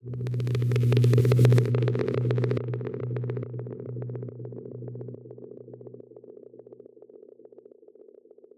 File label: fx aliens